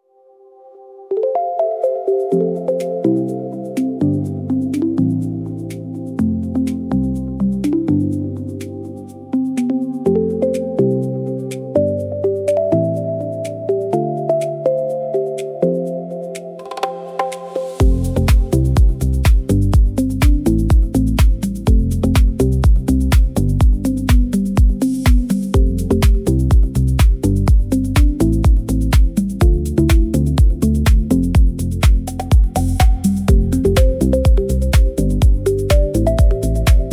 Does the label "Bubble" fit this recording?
No